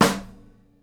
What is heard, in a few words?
SNARE, Live Snares